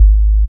DR. LOOP, BASS; X-Static Goldmine 3

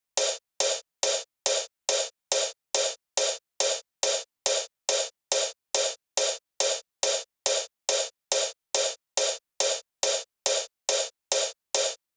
drum Loop 140BPM Ride

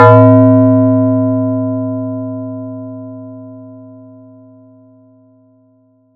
FX RND BEL; ModelCycles

